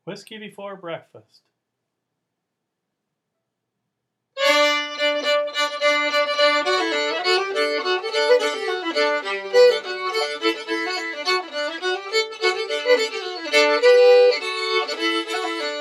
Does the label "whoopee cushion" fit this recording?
No